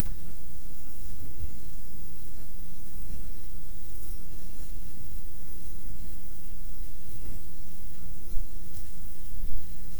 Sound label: basic button press for